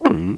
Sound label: gulp; Bonzala; Revista CD Expert 50